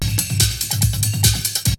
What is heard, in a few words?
BREAKBEATS, TECHNO